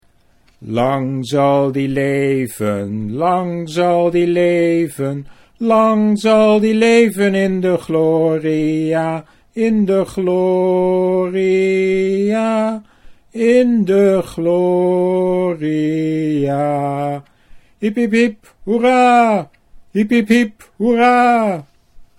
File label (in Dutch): lang zal die leven